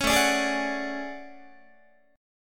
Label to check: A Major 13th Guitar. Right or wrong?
Right